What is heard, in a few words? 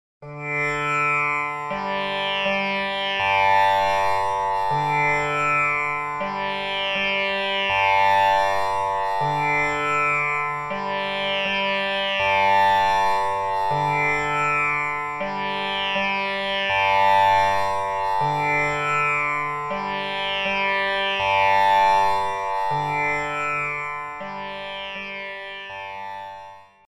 tanpura high g